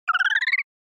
Motion Slide Up

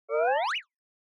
Anime Motion

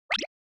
Anime Motion Low

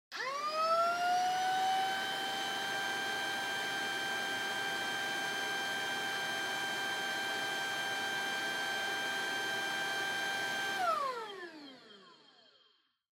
Vacuum Cleaner Vacuum Low Long

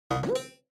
Single Accent Low

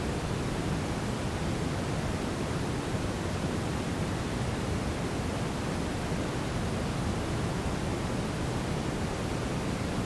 Brown Noise (Stereo Loop)

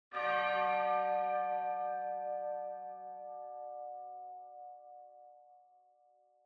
Church Bell Far High Short

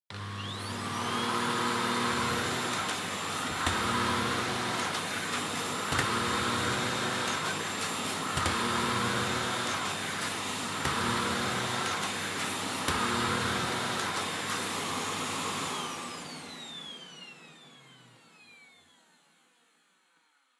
Vacuum Cleaner Vacuum Floor Wood Mid; 掃除機; バキューム 床 木 中

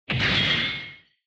Retro Anime Robot High

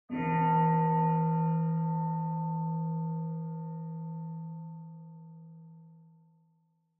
Bonsho Far High

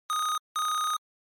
NES RPG Message

